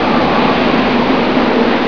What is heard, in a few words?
wind; TNPDEMO